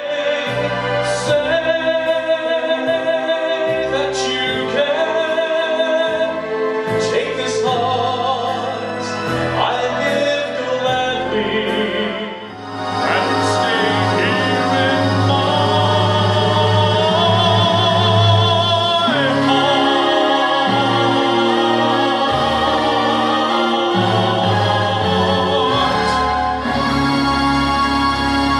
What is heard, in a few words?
My Heart Male Singer, cabaret comedy